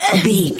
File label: voice female hurt pain